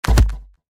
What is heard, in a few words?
bomb Setting; tntbomb